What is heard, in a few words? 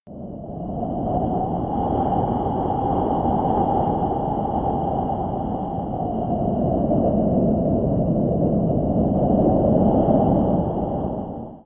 Wind